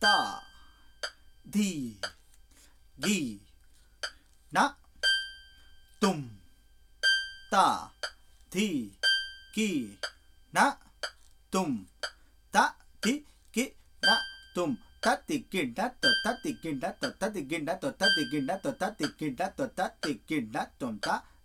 tirmana tala 9

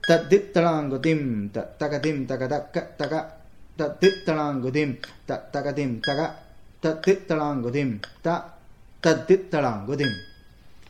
gopucha drop end

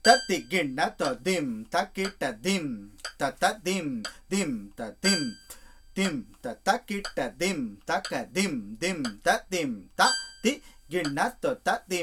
nb phrase 3 5 misra nadai

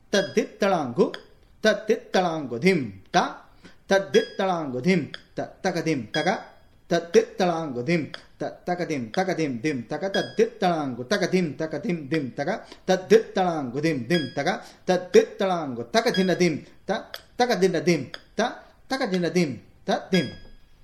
mridangam

